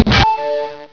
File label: fall; quake2; coop; battlebrowzer